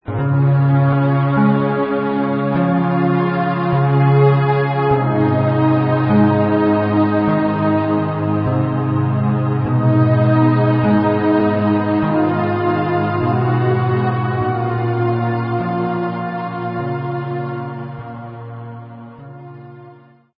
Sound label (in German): Meditation, Meditationsmusik, Entspannungsmusik